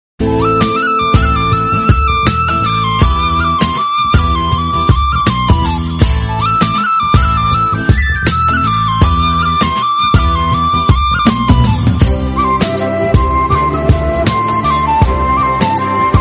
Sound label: sweet melody